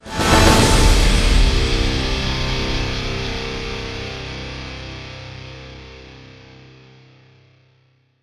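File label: stinger guitar hit quick; transformers war for cybertron gamerip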